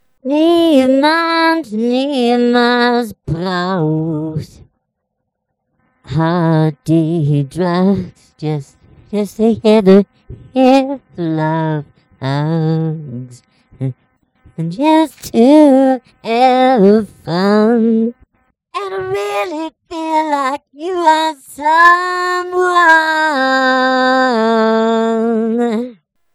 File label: anti drug reminder rap; chipmunk